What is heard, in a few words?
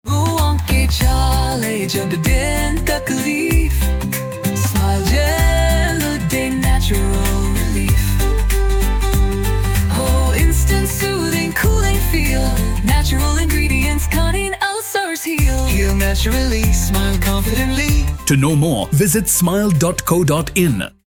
jingle; smyle